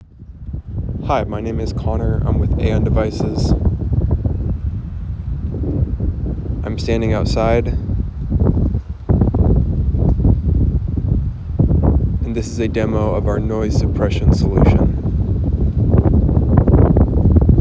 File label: wind input; Single microphone AI-based Denoiser